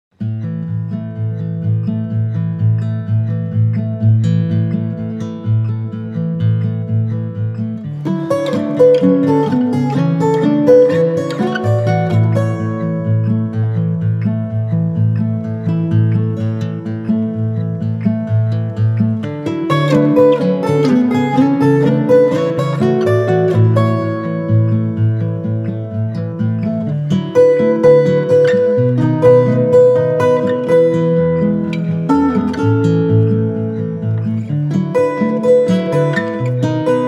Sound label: ACOUSTIC When Morning Comes